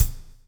BLUES TK, Backbeat; Spectrasonics - Backbeat

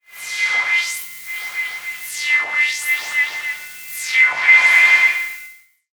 Filtered Noise, radio signals

